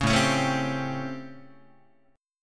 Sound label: A 7th Sharp 9th, Guitar; A7#9; strummed; JGuitar